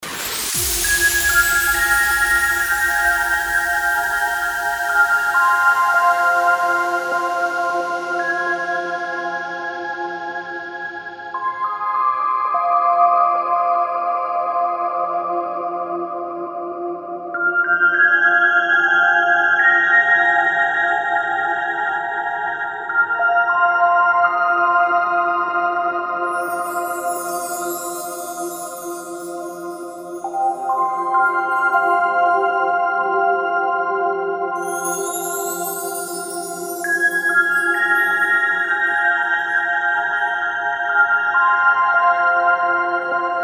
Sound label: DRONE NIGHT BEFORE CHRISTMAS 100BPM FMIN